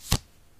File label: card Slide